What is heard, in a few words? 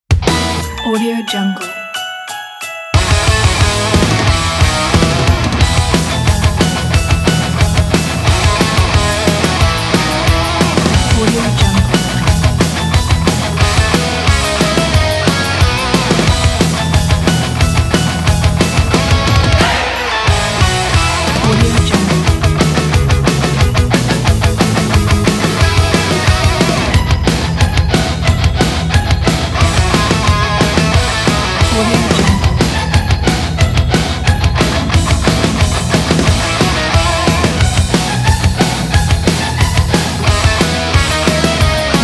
christmas rock